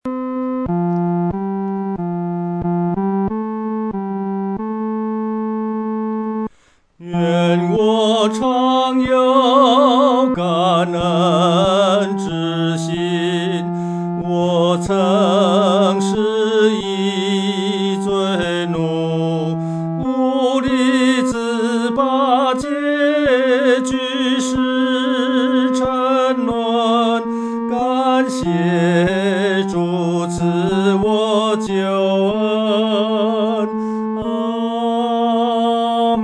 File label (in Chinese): 独唱 第三声